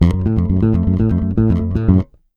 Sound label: RUMBLE F#, Bass, 112 BPM; Sampleheads